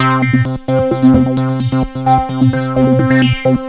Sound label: acid jazz